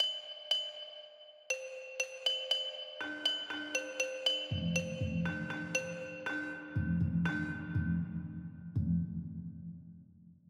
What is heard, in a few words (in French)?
canon rythmique en canon sans reprise